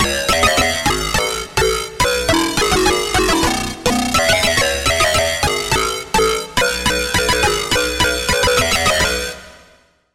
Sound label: Pluck; bug bug; Routed Sound; Serum 2 Expansion - Hyper Gamer Vol.1